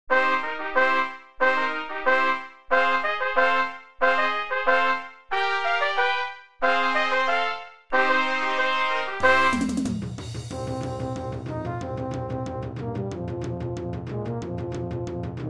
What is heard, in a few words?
Standard rock; midi